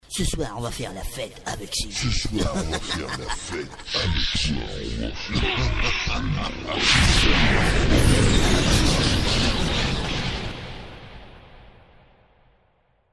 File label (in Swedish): djingel; sky